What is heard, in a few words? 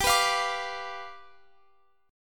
G 7th G down Guitar Open F; G7 strummed